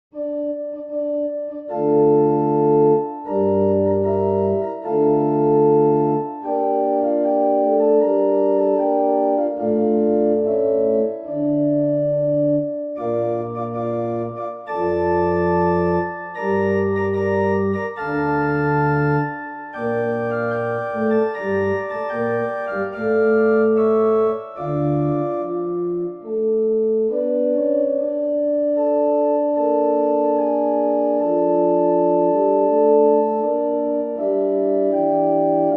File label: vieux noel old christmas carol